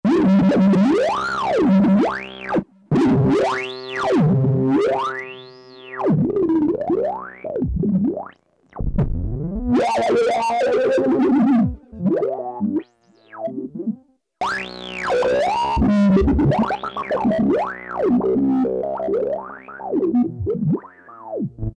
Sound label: Cyberpunk Mod. Wh, Roland JD-990; Vintage Board